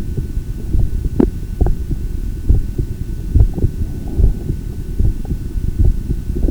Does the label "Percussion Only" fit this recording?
No